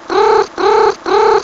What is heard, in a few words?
warble